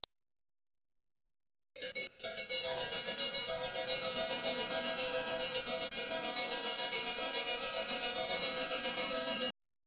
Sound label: soft questioning tone